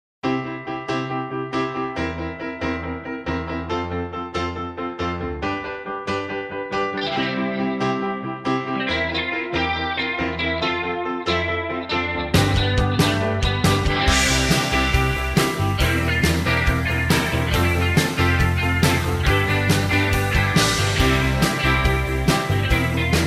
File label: (C) No Backing Vocals